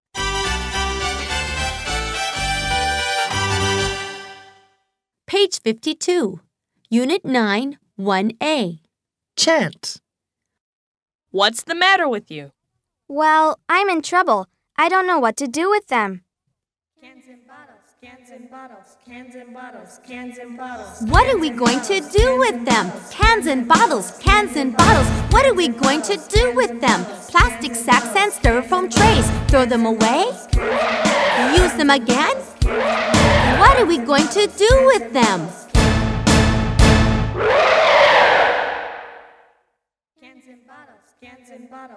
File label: Chant